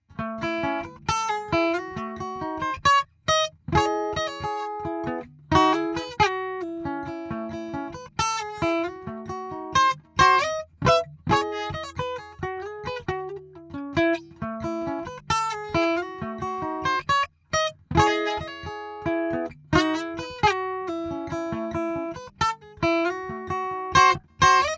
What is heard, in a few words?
Taylor 814 Neumann KM84, guitars